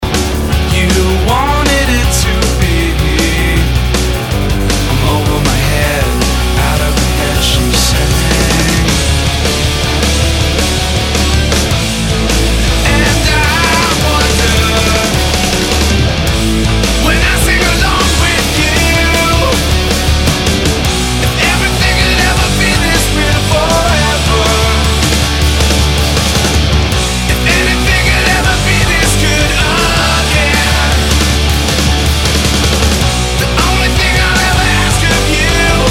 SAVAGE CRUNCH

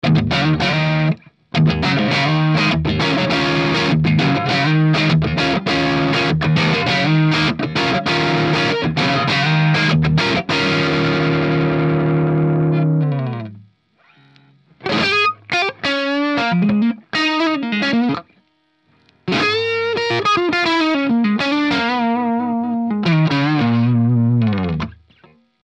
CRUNCH

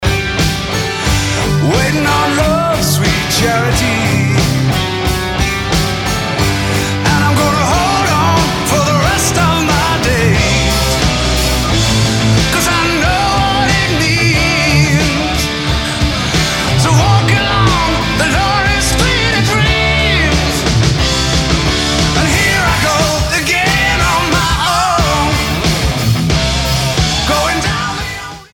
MIX AXE G12T75; jcm800 4 speakers

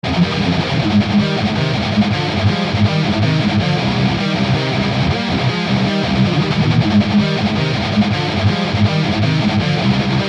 KEMPER 4 GITY

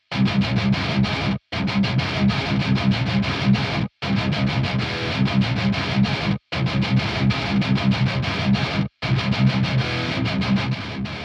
kraken 4 gity; krakenrevv

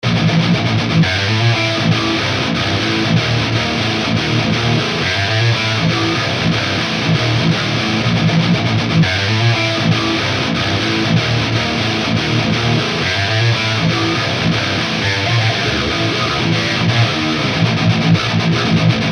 MESA DR MESA OS CAB

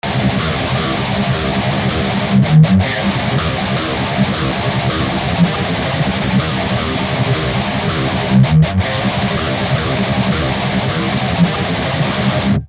pure cab on

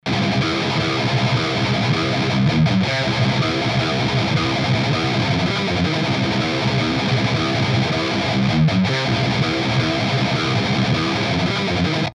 mbdr one; revv

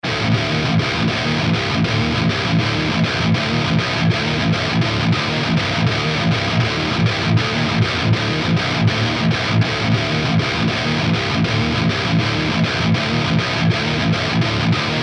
GIT OVERSIZED, mesa

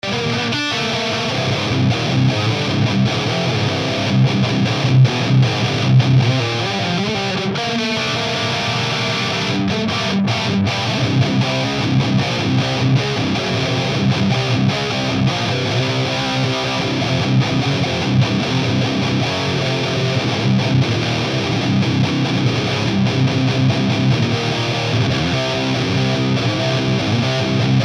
MBDR ALL 12 GAIN MAX

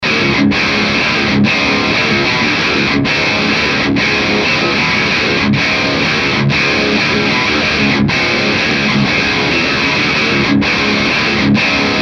GTR

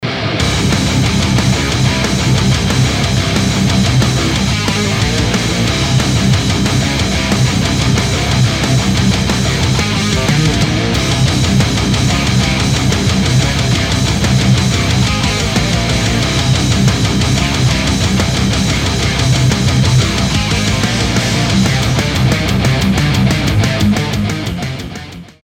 REAL BACKING

